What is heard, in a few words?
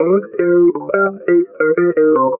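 guitar, Roots Funk; USB Soundscan vol.41; AKAI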